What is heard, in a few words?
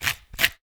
Pepper Grinder; Hits